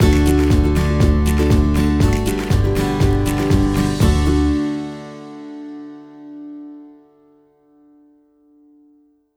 Corporate Transition

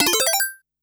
Game Sound

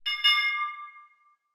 Notification

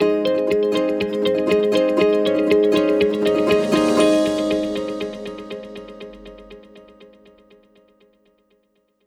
Corporate Transition

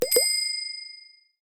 Notification

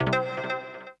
Notifications and Buttons

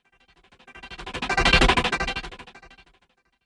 Strobe Transition

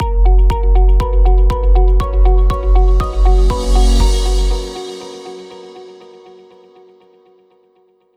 Corporate Transition

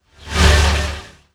Aggressive Transition

